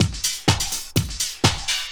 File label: drum loop 125, fxdrops; house; PS-28 Vocal House Samples; drumloops